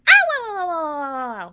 on fire; toadette; SM64 CO-OP

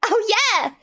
yahoo; birdo; SM64 CO-OP

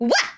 punch wah; birdo; SM64 CO-OP

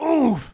ooof; foreman spike; SM64 CO-OP

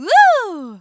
yah wah hoo; pauline; SM64 CO-OP

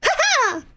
haha; birdo; SM64 CO-OP

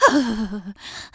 panting cold; pauline; SM64 CO-OP

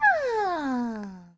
yawning; toadette; SM64 CO-OP

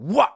wah; foreman spike; SM64 CO-OP

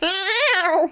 panting cold; birdo; SM64 CO-OP

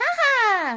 hoohoo; toadette; SM64 CO-OP

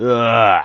"eeyugh"; SM64 CO-OP; Bob's Burgers

